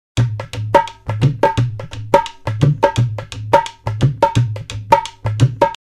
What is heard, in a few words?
dholak